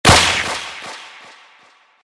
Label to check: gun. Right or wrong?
Right